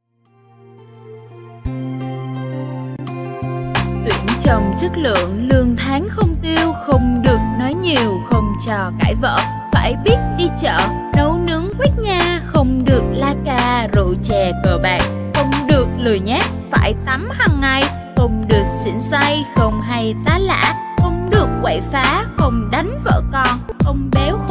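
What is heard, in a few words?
hot girl rapping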